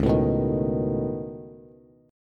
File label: Db 7th Suspended 2nd Sharp 5th Db, down, Bass Standard; strummed; JGuitar